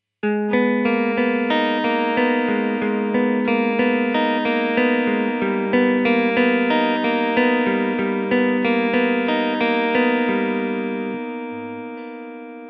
tc 2290 normal delay

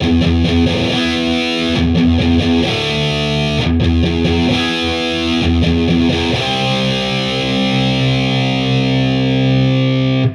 quad A, amp samples

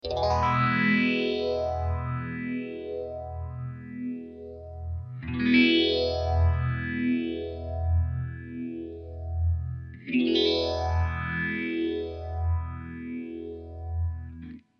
phase

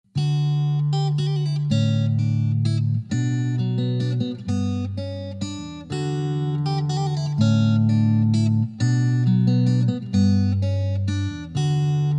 acoustic

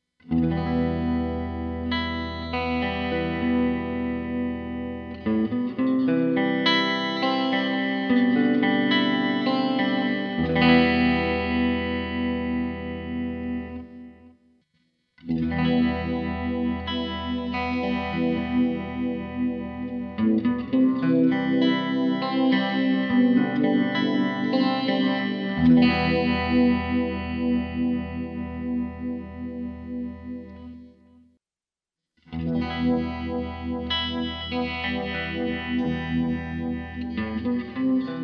moon phase vs axe fx

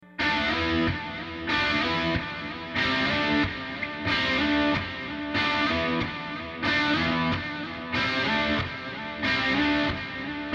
tape delay

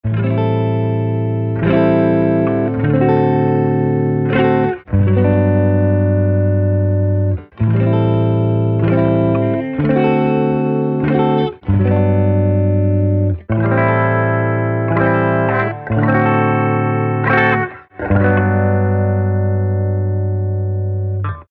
clean

